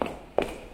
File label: high heels walk